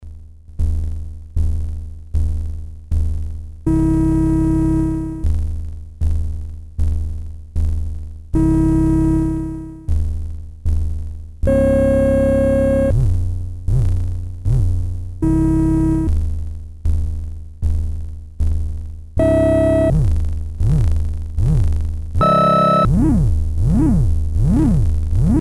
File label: synth triangle, triangle bass; Polivoks formanta